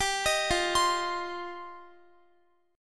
F 7th Suspended 2nd F down Guitar; F7sus2; arpeggiated

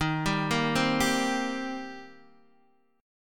Eb 6th Eb down Guitar DADGAD

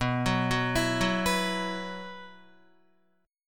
B Suspended 4th, Guitar; Bsus4 arpeggiated; JGuitar

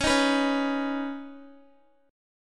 Db 7th Suspended 2nd, Guitar; Guitar-4F,4A,5C,5G,5C,6E; Db7sus2 strummed

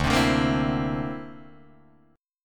D 7th Suspended 2nd Sharp 5th, Guitar Drop D

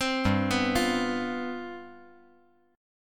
G 11th G, chord

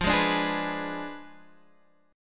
F Diminished 7th Guitar Standard; strummed; 13,11,12,13,12,x; JGuitar

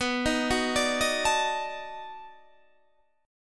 B 7th Sharp 9th, down, Guitar, Standard; arpeggiated

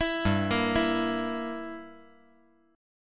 G Minor 6th

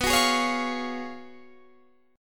F sharp Major 7th Suspended 2nd Suspended 4th, B

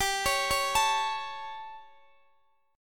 G Suspended 2nd Flat 5th G, Guitar, Open D; chordshape; down

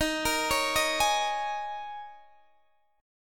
D sharp 7th Suspended 4th, Guitar, Open D

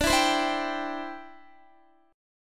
D 7th Suspended 2nd Suspended 4th D, Guitar; D7sus2sus4; strummed; x,17,14,17,16,14

